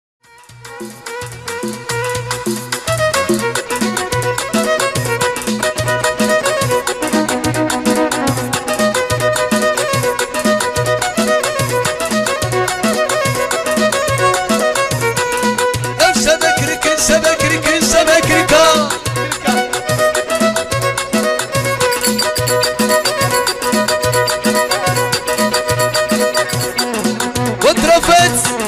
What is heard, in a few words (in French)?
chaabi Ambiance Marocain